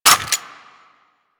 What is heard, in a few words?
Grineer Lever Action Rifle Mech, Weapons